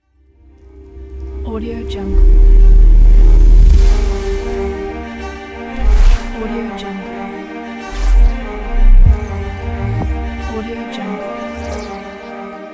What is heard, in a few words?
soft modern trailer logo